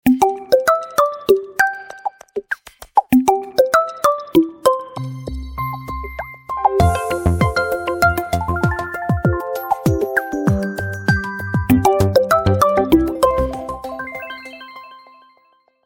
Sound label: Oneplus 9 Ringtone